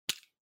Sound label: Stopwatch Mechanical Grab